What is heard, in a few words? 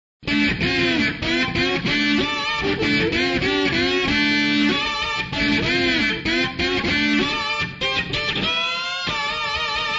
erhu